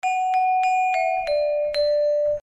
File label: happy happy happy bells; soobshcheniia sms